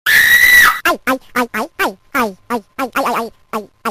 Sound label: AYAYAY